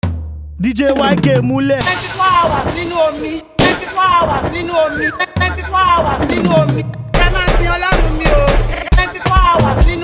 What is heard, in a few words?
24 Hours Beat Instrumental